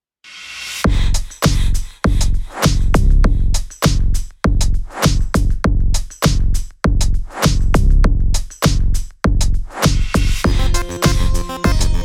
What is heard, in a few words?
sitar mystery, 100